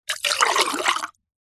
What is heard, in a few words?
food drink water pour into glass fast